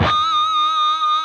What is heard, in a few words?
PRS PINCH, Guitars; Spectrasonics; Hans Zimmer Guitars VOL-1